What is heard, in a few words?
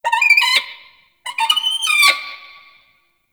Owl Critters Vocal Idle; Ambience; Duviri